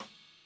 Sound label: Floor